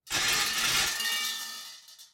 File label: bell